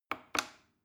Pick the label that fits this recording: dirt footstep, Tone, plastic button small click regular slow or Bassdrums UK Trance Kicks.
plastic button small click regular slow